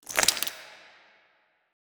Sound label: small legs up down; biogenesis